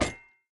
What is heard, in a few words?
idle sickle ground; smite; osiris